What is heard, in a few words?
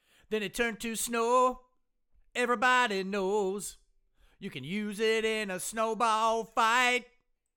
vocals